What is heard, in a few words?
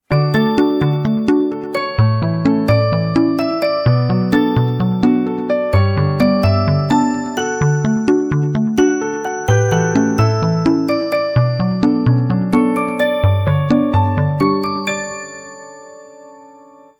New life, ringtones